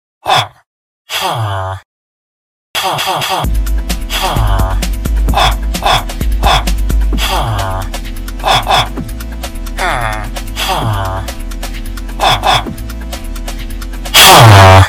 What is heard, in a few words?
villager type beat